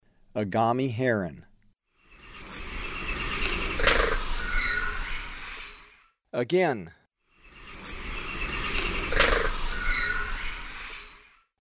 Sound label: Agami Heron; S. America; Eastern Lowlands